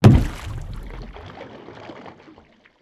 Boat Hit; UltimateFishing2020

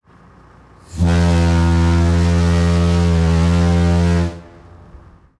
Ship Horn; UltimateFishing2020

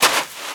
STEPS Sand Walk; UltimateFishing

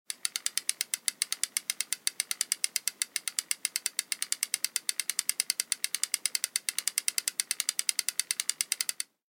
fishing reel slow; UltimateFishing2020

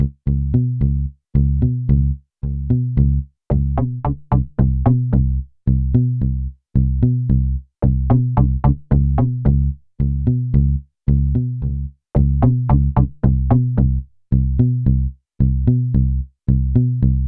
bass Loop; PaulosCreations

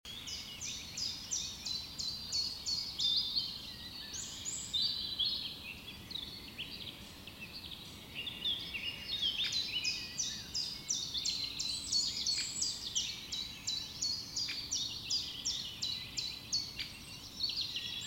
spring morning woods ambience; UltimateFishing2020

